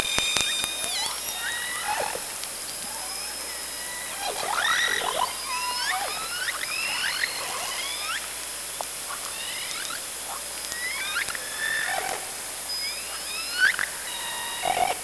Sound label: Orca sound Night Passby